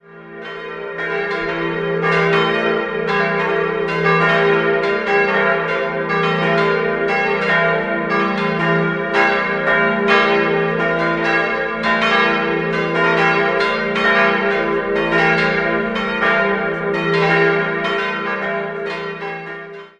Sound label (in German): Elsendorf, Glocken